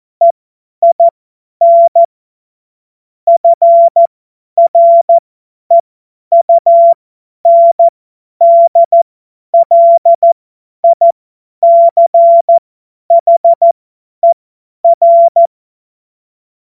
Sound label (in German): Witz, cw morsen; Tempo 50 F70